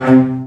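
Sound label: CELLOS D4, Strings; ILIO; Synclavier Strings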